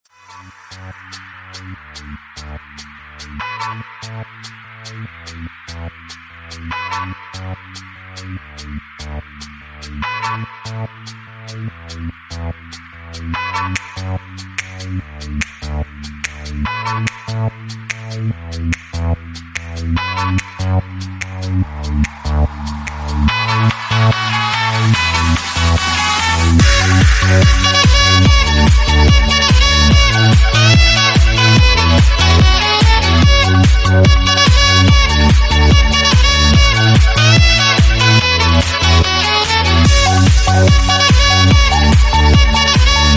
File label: Epic sax, Nightcore